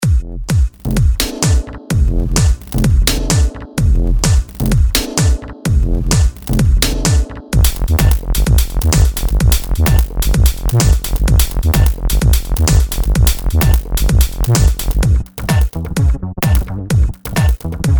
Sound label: tech house tops; micropacks